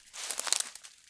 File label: Crunchy Step, outdoor, Footsteps